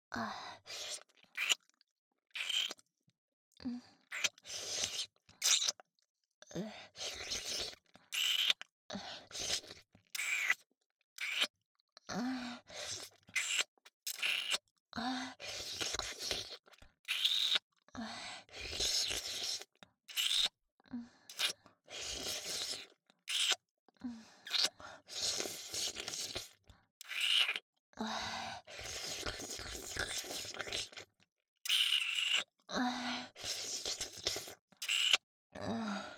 キス, ASMR; アドリブ集

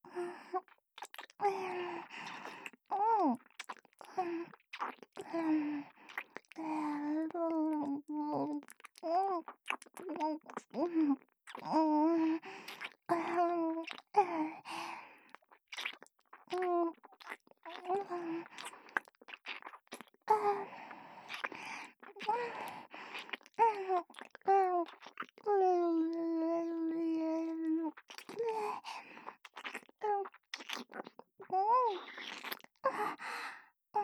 ディープキス, ASMR; KU100; ハーレムASMR

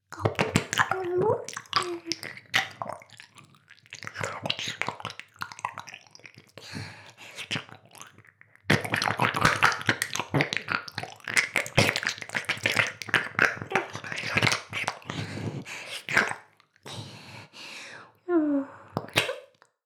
耳舐め, ASMR; 全妹計画２; メスガキ妹; 全妹計画<オール・シスターズ> 2妹め

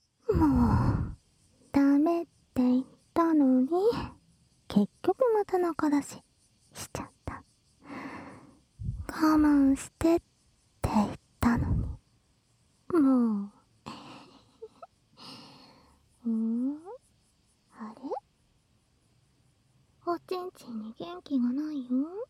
反転 キス＆耳舐め; 煽りまくりピストン; 巫女のお姉ちゃんは本当に処女?～おちん〇んで処女膜探しするけど気持ちよすぎてすぐ負けちゃう～

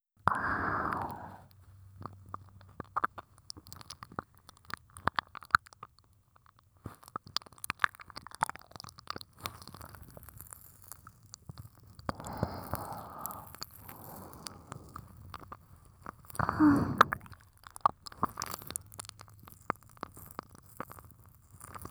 耳舐め 軽・従順, ASMR; 清楚ビッチエルフ姫のメガ盛りマントロフルコース

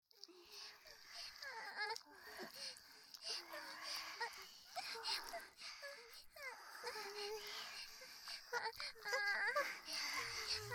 オナニー喫茶（喘ぎ+水音+ローター音）; 桃狐の万能オナサポボイス; ロリっ子達の喘ぎ声に囲まれたVIP席; バイノーラルASMR

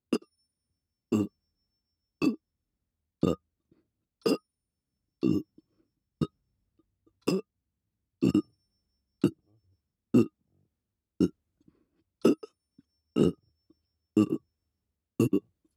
右耳 4拍子 「お」 の ゲップ メトロノーム; 月賦村レポート作成資料〜連続ゲップ真穂の練習〜

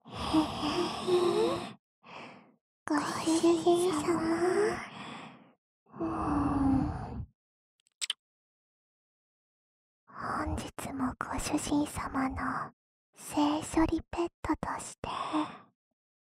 2人に分身しちゃいました♪ SE無 通常耳舐め; 琴音; 催眠アプリで下校中の少女をお持ち帰りペット調教♪; KU100

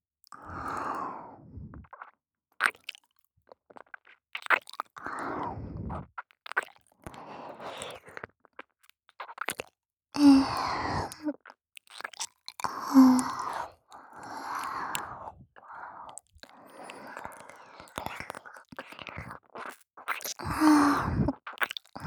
耳舐め; Sっ娘JK; 満喫デリヘルJK店

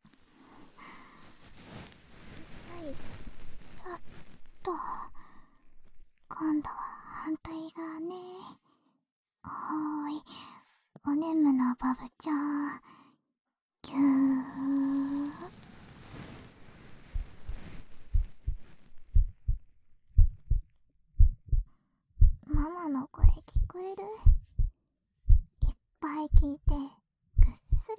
【右】心音＋指耳かき

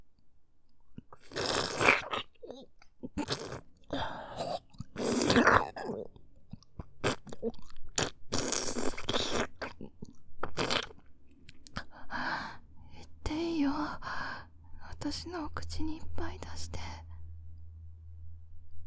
フェラ 音; 10秒いっていいよ私のお口にいっぱい出して

